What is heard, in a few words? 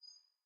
button hover; rise of maya